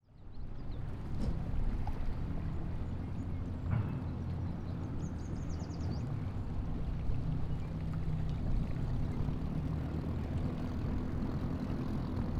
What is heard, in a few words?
Vehicle Boat Motor Boat Pulling Up To Dock; transportation